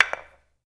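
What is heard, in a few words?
Skull Stone Hit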